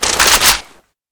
mgun draw; weapons; deluge

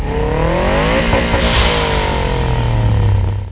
motor

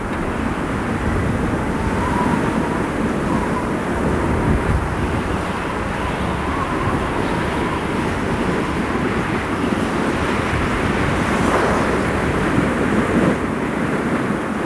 arctic wind

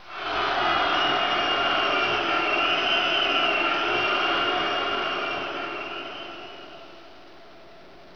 boo

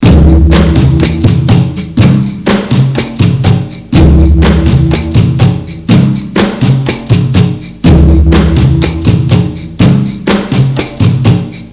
kompang

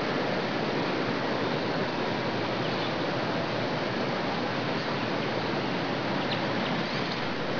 water; castle

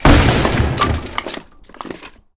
wood break; debris

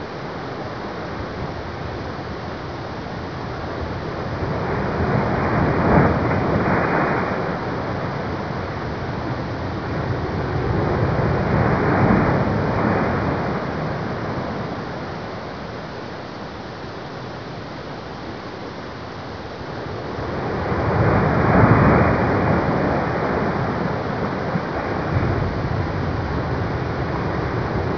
waves; de pirates

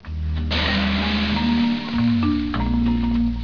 sauna

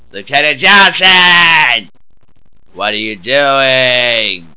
zombie; de compclub

